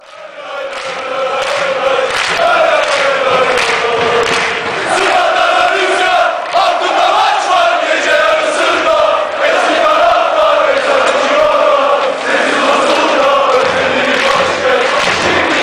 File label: stadium is windy, fanchants